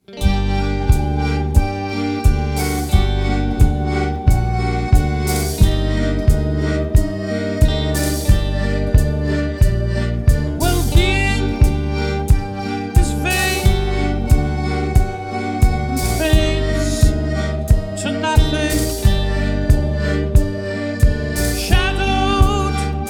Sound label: 100Hz BOOST 5.5 180Hz ATTEN 5 12kHz BOOST 8 20kHz ATTEN 2